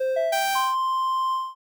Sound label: rhino big Win